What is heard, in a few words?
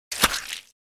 Single footstep wet muddy puddle